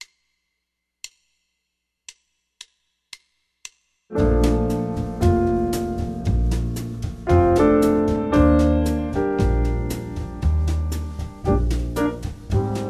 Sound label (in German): 2 takte dur tenor, saxophone